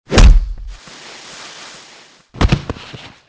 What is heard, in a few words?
hit; Soldier Of Fortune II Double Helix; XBOX